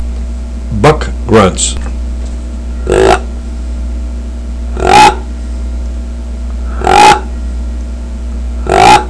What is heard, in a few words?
big buck buck grunts; primos